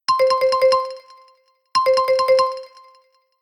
Rolls; SP6

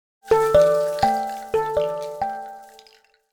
Rain; Aleksi Eeben Demo 2018

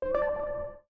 Wireless Charging Started; Tecno; Spark Go 2022

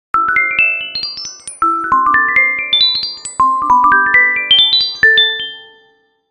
Nokia Tones; Windows Phone apps

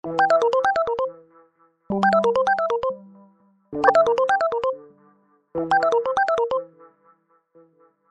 Pure Tone; Samsung; SCH-U680 Convoy 3

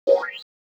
SMS memory full

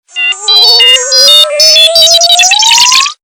Building Excitement; Motorola; Droid 2 R2 D2

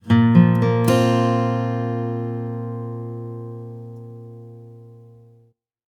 Acoustic

